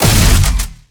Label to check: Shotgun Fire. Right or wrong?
Right